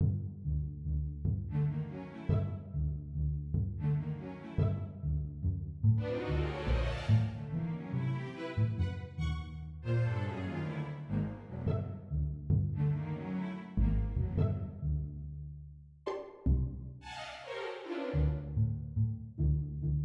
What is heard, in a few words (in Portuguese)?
Valsa Mandada